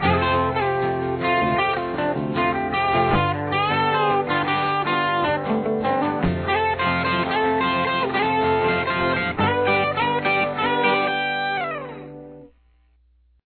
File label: run